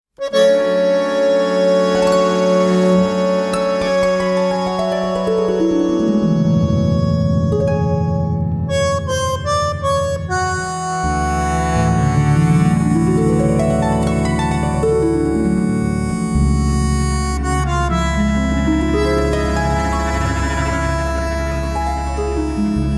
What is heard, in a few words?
Tango Vals